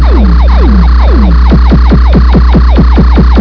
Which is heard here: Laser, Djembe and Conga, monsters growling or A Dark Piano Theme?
Laser